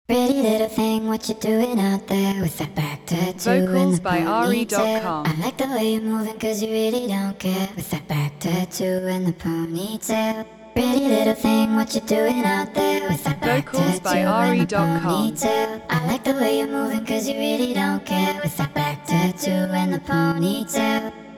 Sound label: 180 Dmaj, vocals; 180bpm